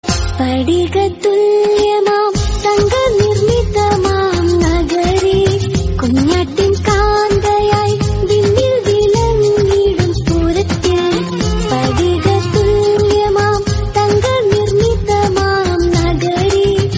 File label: Malayalam Song